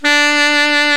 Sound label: SAX COMBO